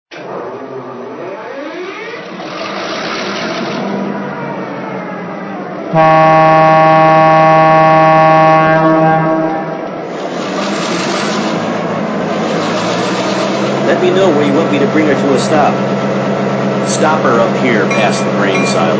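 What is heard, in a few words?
MP15AC DC, locomotive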